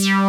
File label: ELECTRON BS, DANCE; Pro-Rec - Synthsonic; Z1 DANCE